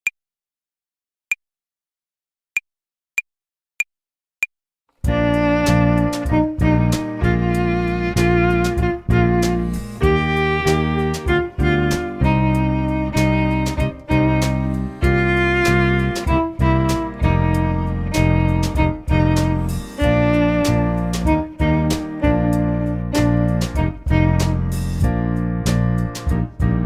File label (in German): Tempo und Rhythmus Übung 2, Play-along, Jazz Club Violine